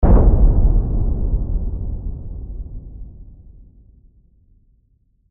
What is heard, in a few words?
foley footsteps rumble